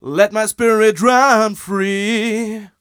m 127 mel a, Vocals

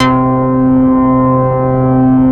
MOOG BASS C4; Best Service Dream Experience

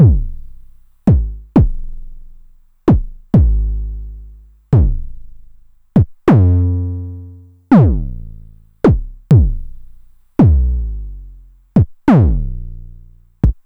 909 mod BD - BASS DRUM

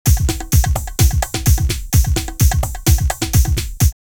drumloops Rave 128; X-Static Goldmine; 90 s Sample CDs

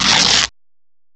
RIP; M8; CMI Factory

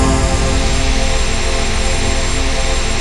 ATM TONAL; Best Service - XXL Production Effects

